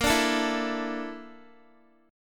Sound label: A sharp Major 7th Flat 5th, down, Guitar; strummed; tuning 4F,4A,5C,5G,5C,6E; chordshape